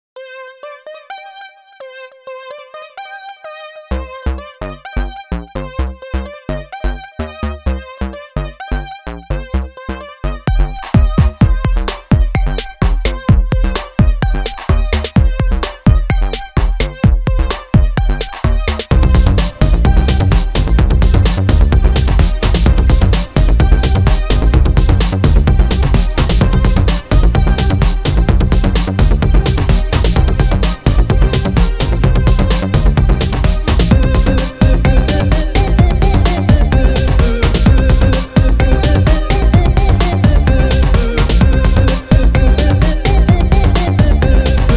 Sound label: Keyboard